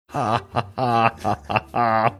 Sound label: forced laughter